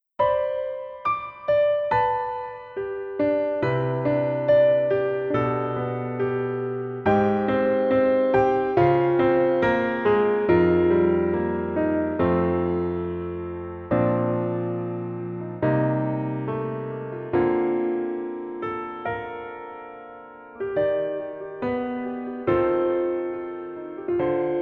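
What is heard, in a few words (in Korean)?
Piano version 멜로디MR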